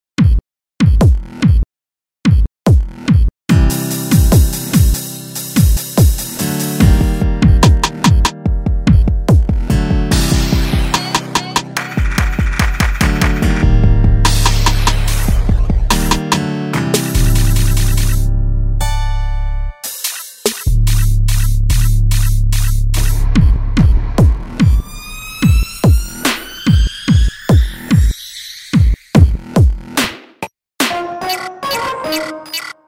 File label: Noisefloor